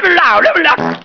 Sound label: fart